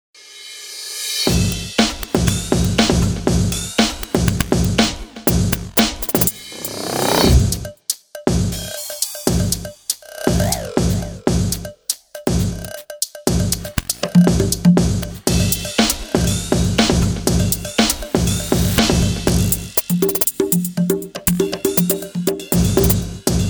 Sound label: drums Programming